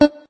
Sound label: note beepey